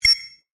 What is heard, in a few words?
Letters Appearing